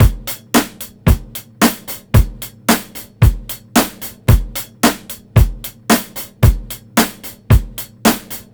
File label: beat, Roots Funk; USB Soundscan vol.41; AKAI